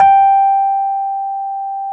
E PNO; Synclavier Sampler Library

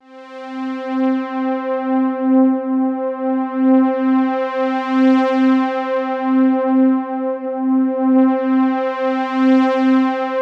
C3, STRINGS; BEYOND; Music Store Sound Service; Einstein

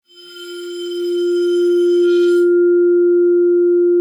Arco F4, Vibes; Xsample VOL-4

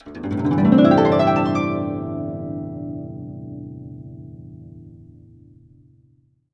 GUCH GLS, GUCHIN; INIS - Opium; GUCHIN-GLS

